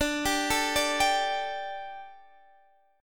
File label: D Suspended 4th